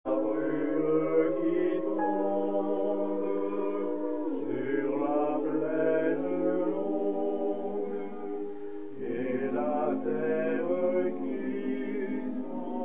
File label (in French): Basse; Brume